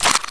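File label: m4 silencer screw off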